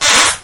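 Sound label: onara, fart; pupupu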